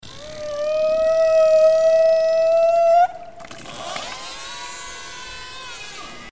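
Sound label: Killer whale herding call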